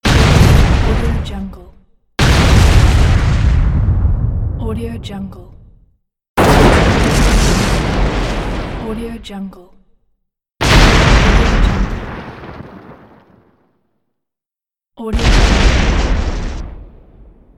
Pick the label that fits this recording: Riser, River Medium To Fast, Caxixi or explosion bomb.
explosion bomb